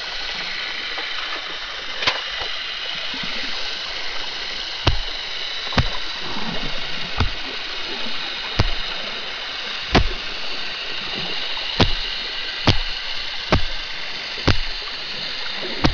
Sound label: drumming